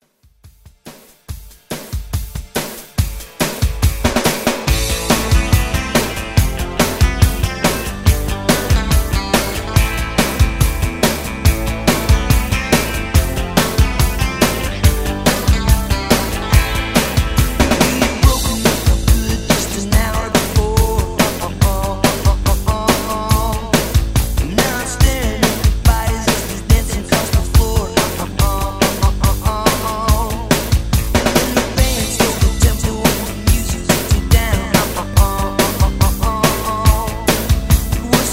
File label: 80s Rock Redrum